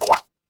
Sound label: sailor launch; arrgh-hoops